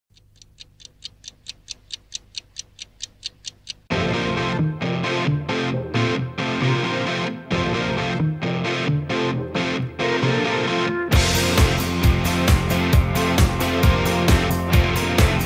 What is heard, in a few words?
French Version